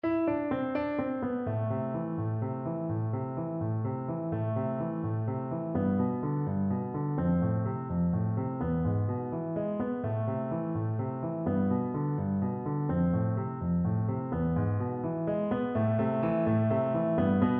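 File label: marimba, Percussion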